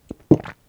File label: swallow